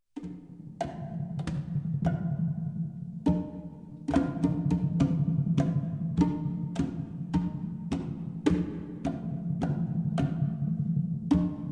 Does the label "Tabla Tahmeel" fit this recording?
Yes